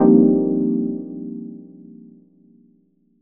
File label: stab satisfying; Melodic Stabs and Hits